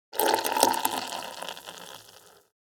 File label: Champagne pouring into crystal glass fizz